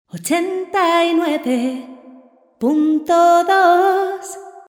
cantante singer

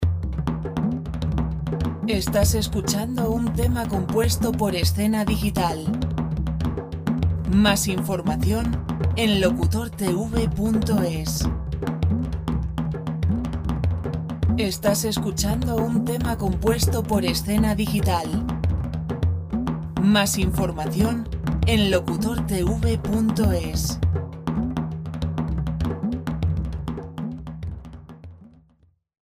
etnic ritmo

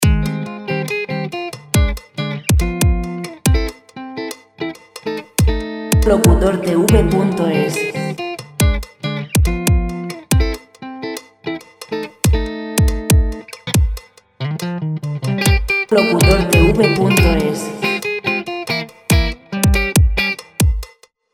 140 pop, piano; Background piano music

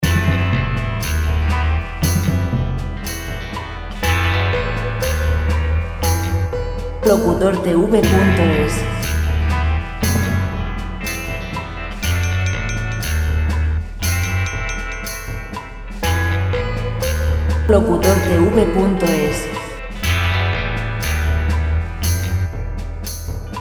guitar rock, background royalty free rock music

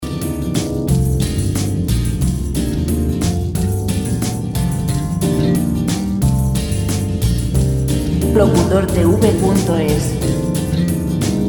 guitar, guitar royalty-free music

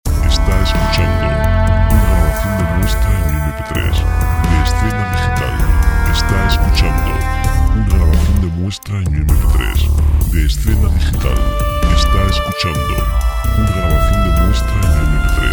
chill; documentales

